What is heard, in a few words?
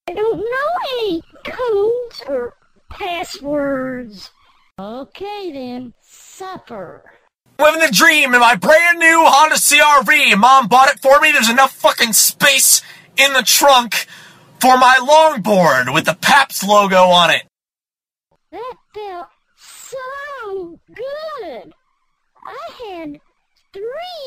RINGTONE